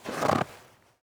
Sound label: snow step; Golden Crayon Sounds